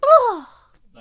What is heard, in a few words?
Halfling Death Vocals